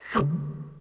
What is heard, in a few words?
eat Gulp; Feeding Frenzy